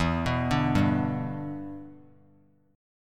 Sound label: E Minor 7th, down; arpeggiated